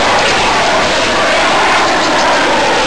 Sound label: AIRPLNE; trasporti; aerei